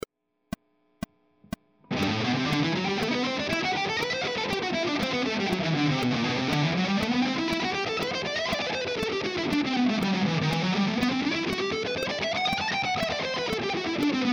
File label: connecting scale patterns